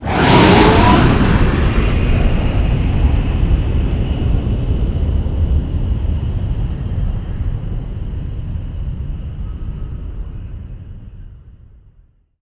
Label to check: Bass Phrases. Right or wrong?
Wrong